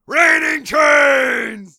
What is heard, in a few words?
train rain; tf2ware ultimate